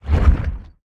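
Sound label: movement heavy push; smite; god atlas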